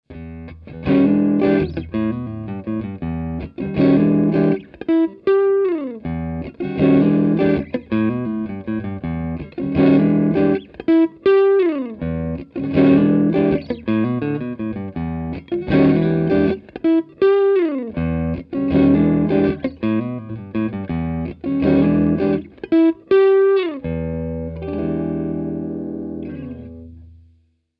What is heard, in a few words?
Elec Gtr Location Small Room Centre Raised